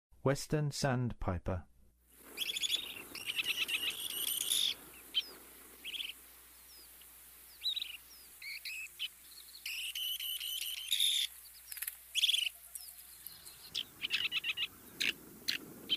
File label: Western Sandpiper, Songs of Birds of North America; Western USA; Western USA Songbirds